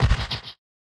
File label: Snare, One Shots, Drums; Touch Loops; BlissedOutBeats